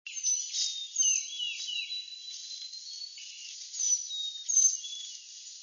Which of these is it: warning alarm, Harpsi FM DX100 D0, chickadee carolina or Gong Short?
chickadee carolina